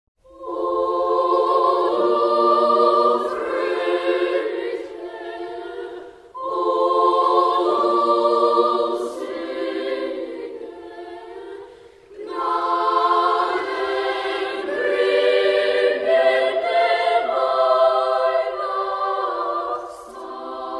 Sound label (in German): O du froehliche, Sängerknaben; o du froehliche alt; Die Wiener Sängerknaben